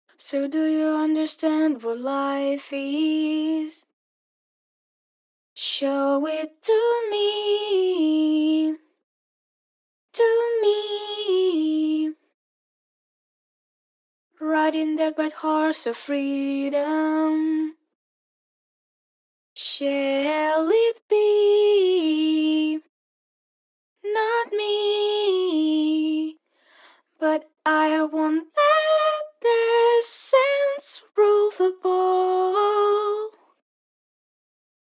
pop, 70, Em, acapellas

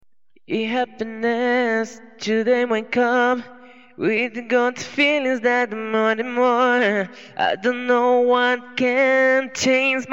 dance 130 Bm, Acapellas

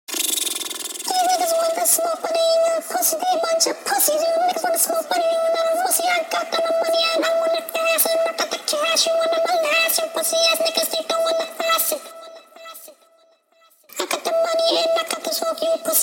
hip hop, 125, F sharp, Acapellas

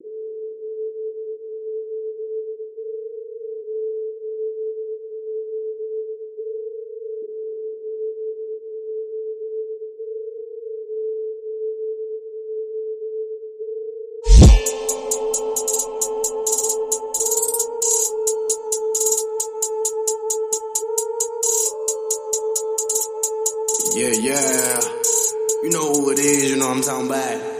Remix, hip hop/rap

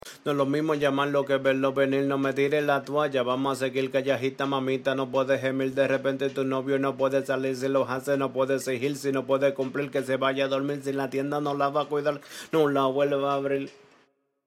trap 120 Db, Acapellas

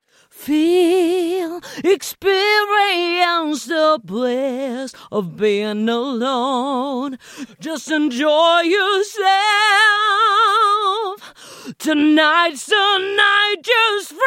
EDM Dance pop 120 AbM

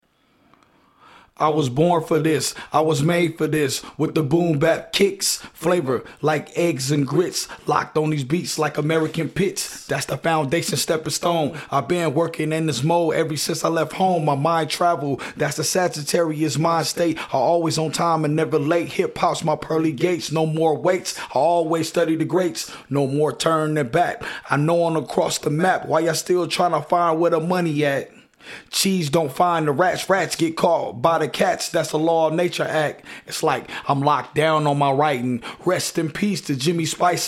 Acapellas, boom rap 104 D sharp

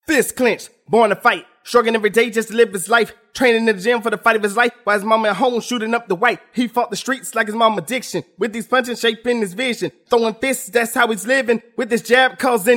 rap, 143, A sharp, Acapellas; Give Em Hell

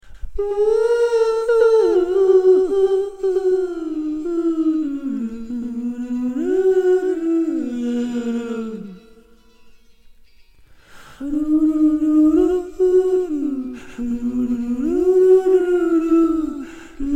Acapellas, folk 120 C

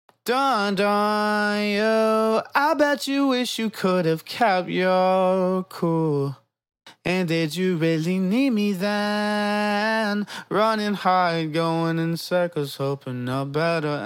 pop rnb 120 AM

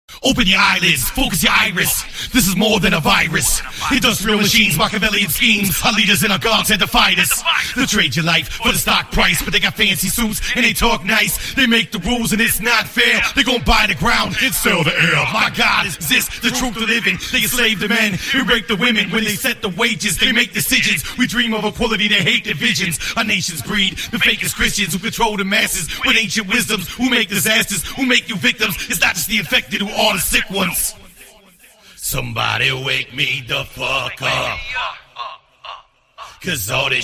rap 125 Bbm, Acapellas

